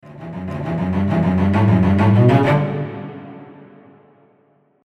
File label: Expo Neg, the same cello crescendo but with a curve of -3.0; Exponential Velocity